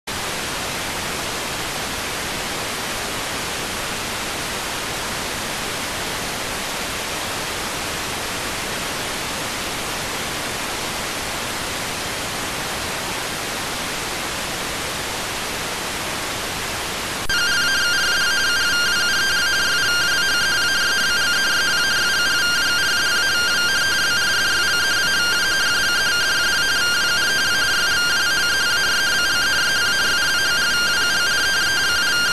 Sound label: rtty rx